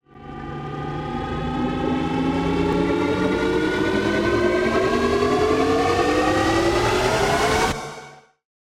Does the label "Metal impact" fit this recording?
No